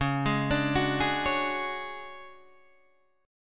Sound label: D Major 7th Flat 5th C sharp, chord